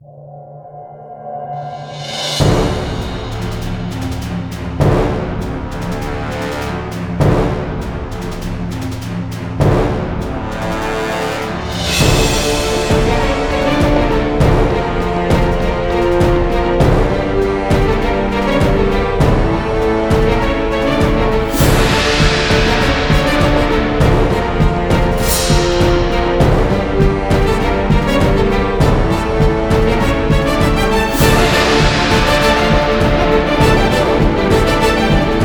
The Seas Wrath (No Choir); Shockwave Sound - Fantasy Worlds Vol II+III

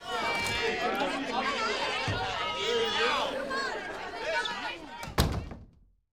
Students Leave Classroom; SFX Kit; Foley - Misc

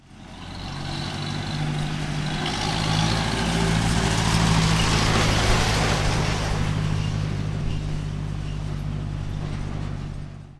cars old cuba, street traffic; best service Blue Box

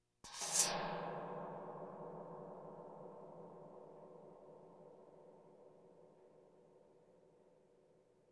Percussion Bongos Tympani rolls Gongs Drums

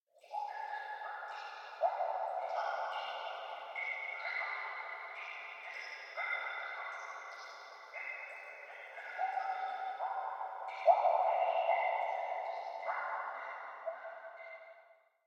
Sewer Cave Drops Underground Water Drips Bubble; Hollywood Edge; sound designer's toolkit v2

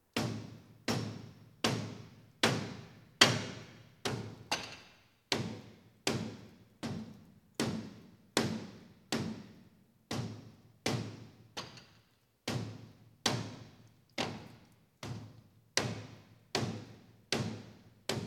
Police, Fire engines

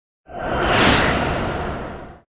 whoosh space ship; NCH Library

